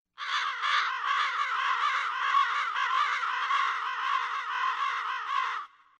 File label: Raven Sms